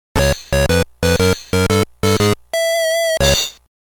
Game Over

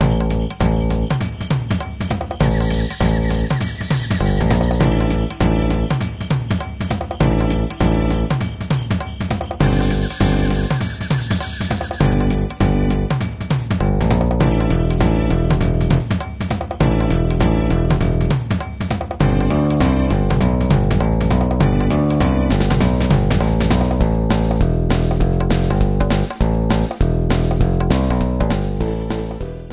Barrel Blast Jungle

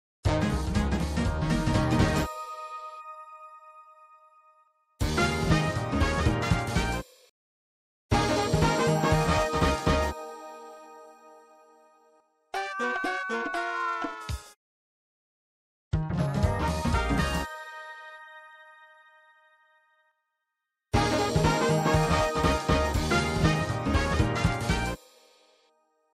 Jingles; Potluck Gang; WarioWare Gold

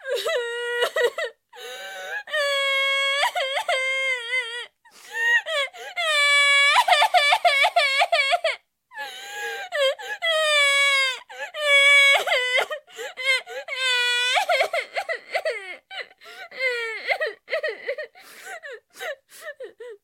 Cartoon, Cry - Female - Crying - Sobbing, Human

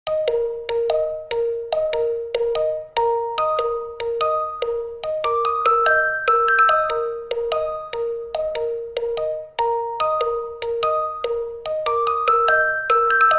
Desert Night; SMM2; SMB

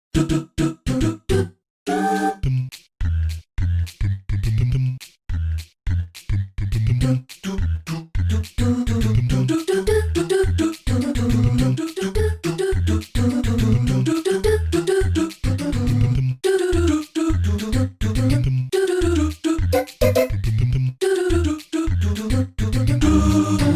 Trampoline Time

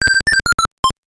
2x Chain & Player 1 Attack

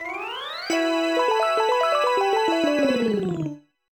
Minigame Clear

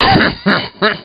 evil laugh; Wizpig

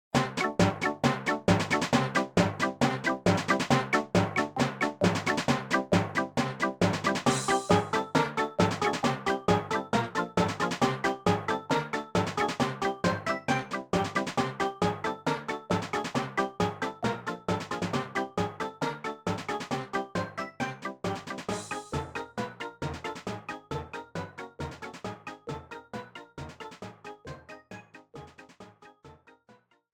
Sort or 'Splode

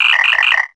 Attack; PMTTYD

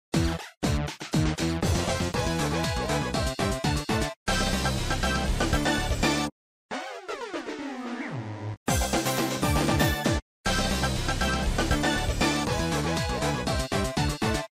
Jingles; 5-Volt; WarioWare Gold

